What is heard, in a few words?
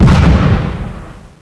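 EXPLOSION MEDIUM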